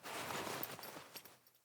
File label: Foley Actions Default Wolf Jog Stop